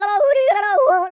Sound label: YODEL; ILIO; Vocal Planet